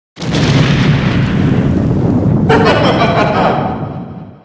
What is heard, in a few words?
emoticon